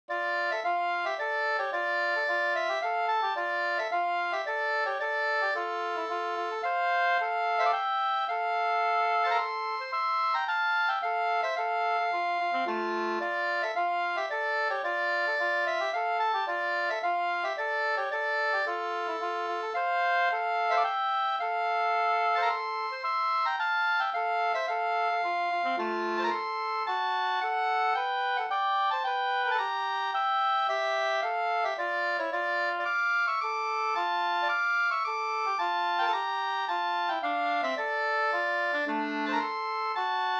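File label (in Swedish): polska, tvåstämmig; Kalmar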